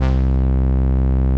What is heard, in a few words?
505, String Machines